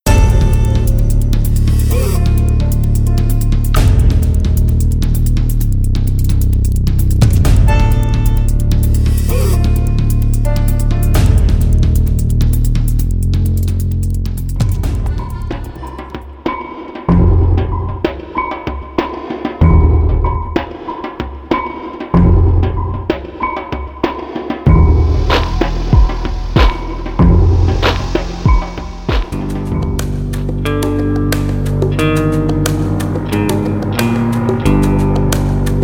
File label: Score Dramatic Orchestral mix